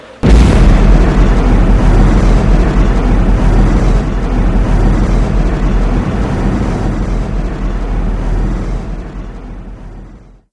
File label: blast wave, nuclear